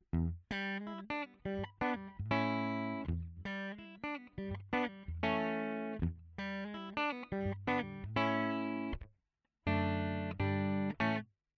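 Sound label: blues fingerstyle DI Balaced Out, guitar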